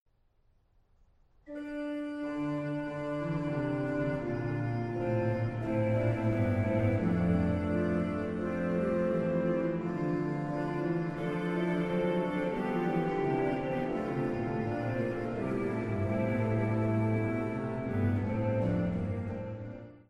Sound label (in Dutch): Orgelvers